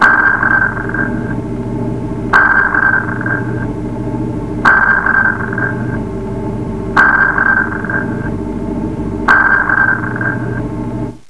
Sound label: sonar; freeciv